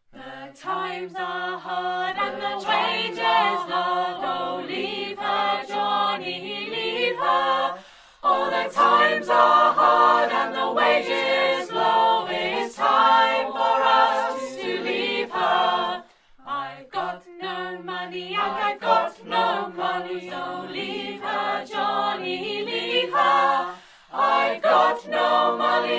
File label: Times Are Hard Acappella